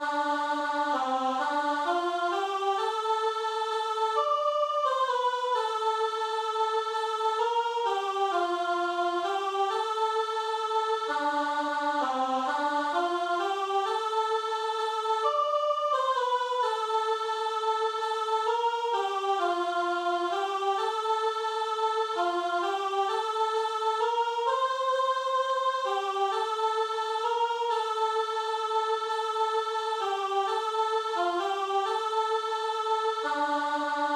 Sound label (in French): la nuit est deja la soprane; veillez et priez